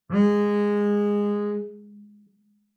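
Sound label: Bass arco fortissimo sul D G2, Double Bass; MIS Pitches - 2014; Strings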